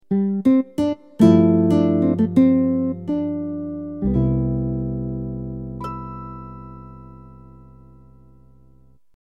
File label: Guitar